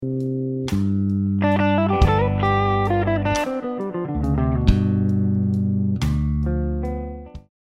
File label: G Slow Blues Lick, guitar; 19 Blues Licks